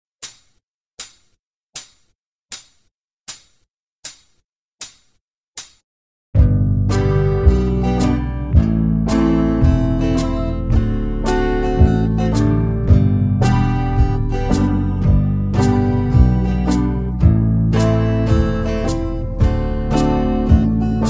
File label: key of g slow, banjo backing tracks; dunphy's hornpipe